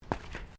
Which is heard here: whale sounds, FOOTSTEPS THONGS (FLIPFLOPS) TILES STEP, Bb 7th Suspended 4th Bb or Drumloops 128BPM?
FOOTSTEPS THONGS (FLIPFLOPS) TILES STEP